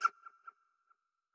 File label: Bat Hurt